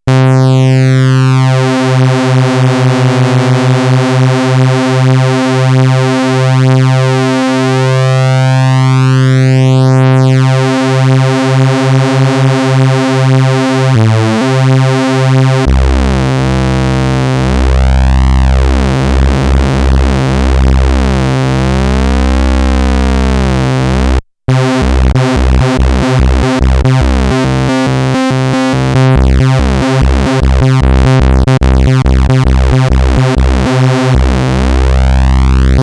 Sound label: test detune, TEST: detune VCO2, Moog Minitaur; analog monophonic synthesizer